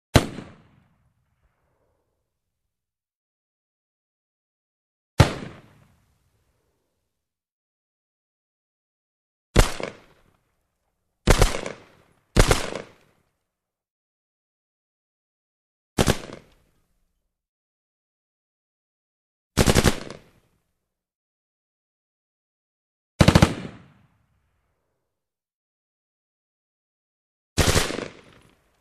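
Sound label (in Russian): звуки одиночных выстрелов M-16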